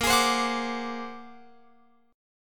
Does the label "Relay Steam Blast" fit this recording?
No